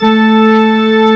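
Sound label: CHURCH ORGAN; CMI Sound Library Rev 1.3; KEYBOARD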